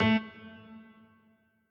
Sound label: piano riff intro